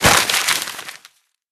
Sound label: evidence crumple